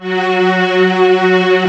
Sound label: STR F 3, Mellotron Strings; Orchestral